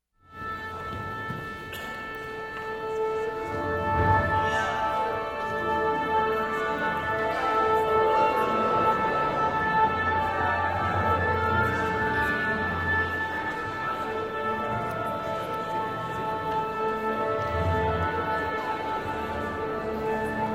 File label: Orchestra playing and people talking